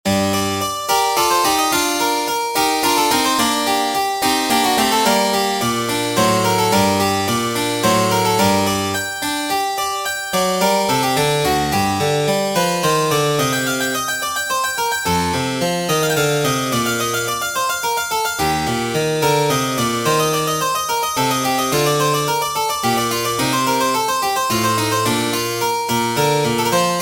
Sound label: fantasy for harpsichord; telemann; TWV8 33